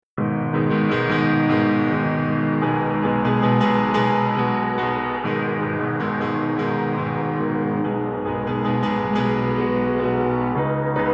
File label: angst piano